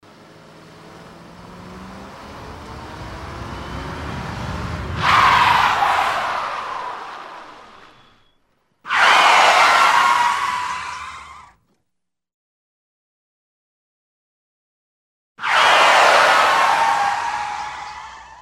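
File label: sounds of car brakes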